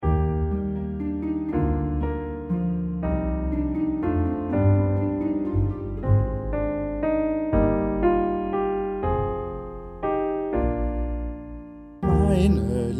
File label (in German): Wecklied (Gesang)